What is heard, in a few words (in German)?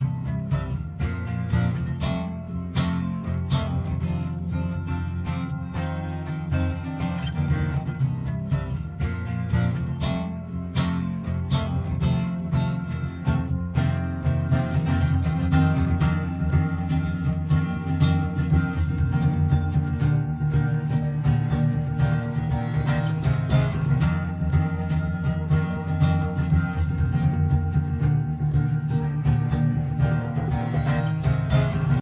nur Gitarre; Steinberg SpectraLayers Pro 10 Demo